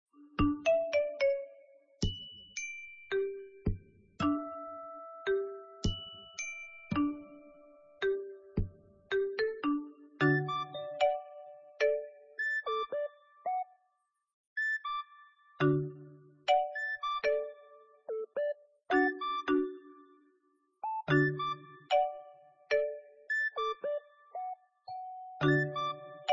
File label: bgm